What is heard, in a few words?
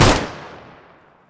sniper tommy shoot; tf2classic